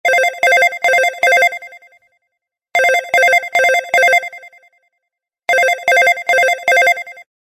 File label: Business Phone Ringtone; ringtones